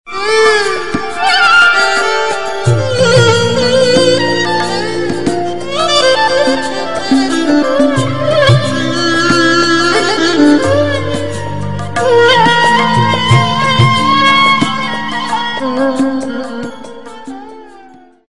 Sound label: ringtone